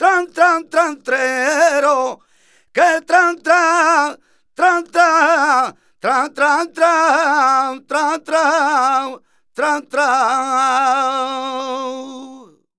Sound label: voc c, Flamenco Vocals Male Cantaor